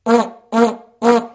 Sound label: Vuvuzela